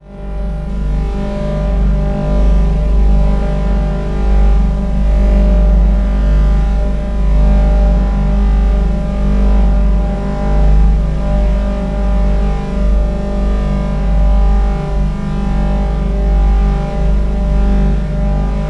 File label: ambience texture synth; bluezone; sonniss gdc